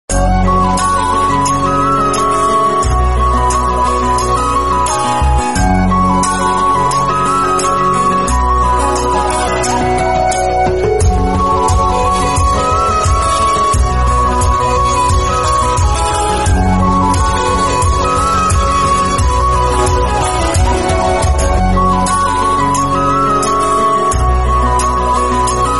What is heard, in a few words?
flute bgm south